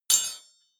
metal impact bar rattle; industrie-wirtschaft

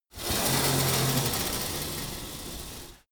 pullup machine cable pull, fitnessstudio; sport freizeit

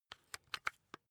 rock drill head remove regular; bohrer; soundpool

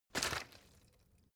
bottle water sparse shake single soft; berufe

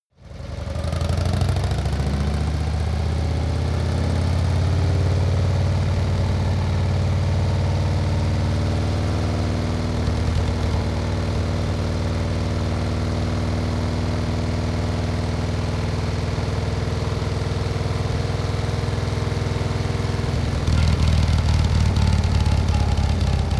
motorcycle harley accelerate slowly long; motorraeder; verkehr transport

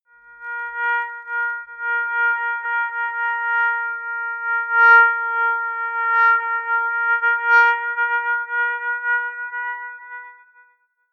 simple moving sine long; toene

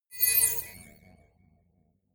notification accept danger; kommunikation; signale

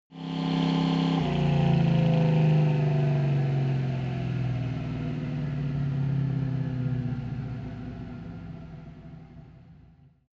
car camaro slow down exhaust

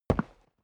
step boots concrete single left; menschen; schritte

